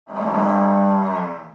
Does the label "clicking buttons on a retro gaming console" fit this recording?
No